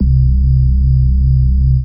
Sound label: SNGL.BASS, Jungle Rave